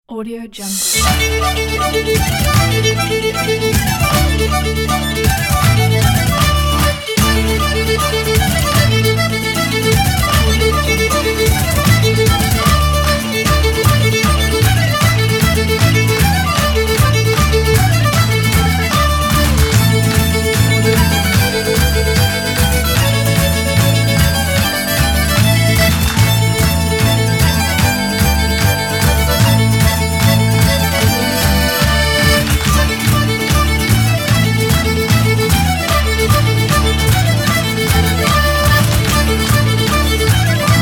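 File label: irish festival folk fiddle violin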